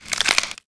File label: Rustle